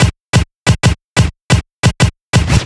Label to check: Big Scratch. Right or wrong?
Right